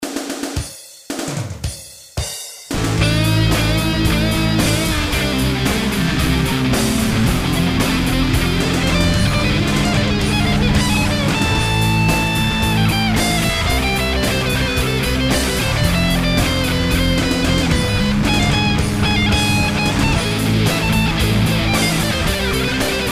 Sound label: Heavy Metal